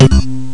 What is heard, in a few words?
BOUNCE